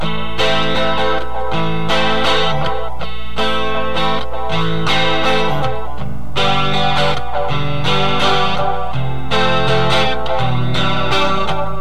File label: Loop In A Minor